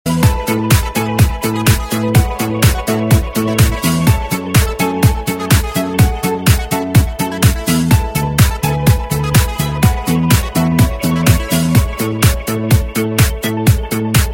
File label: Instrumental